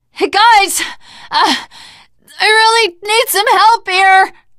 ledge hang middle, teengirl; Left 4 Dead; Source Engine; survivor

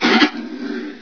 alert; hl1; controller; Source engine

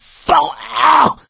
choke; voice; source engine; left 4 dead 2; survivor

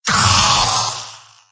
pain severe; engineer; video games; source engine; tf; mvm

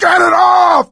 scream while pounced, coach; voice; source engine; left 4 dead 2; survivor

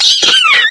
pain, headcrab poison; Source Engine; video game; npc

